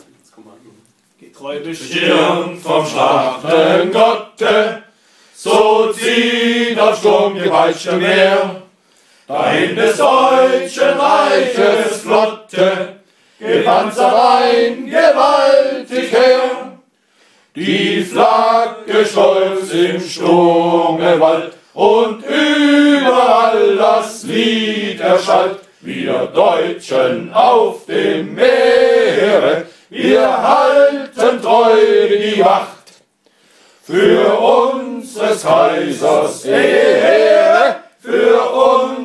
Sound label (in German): Flottenlied ohne Klavier